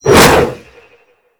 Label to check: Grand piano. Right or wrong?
Wrong